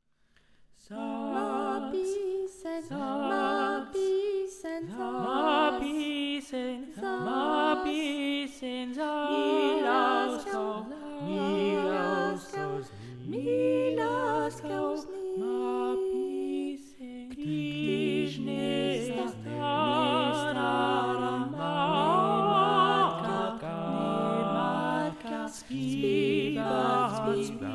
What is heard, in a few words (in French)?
4 voix; kdyz